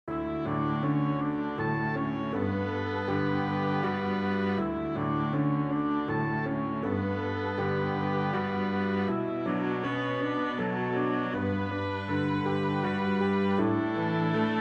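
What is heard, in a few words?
MedLow accompaniment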